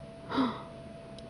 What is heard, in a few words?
gasp; Nokama